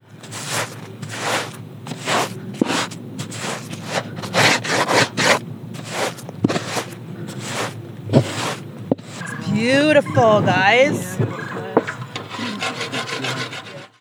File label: TROUGH SAND